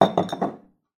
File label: foley bottles x2 beer empty down on table slight distance; zapsplat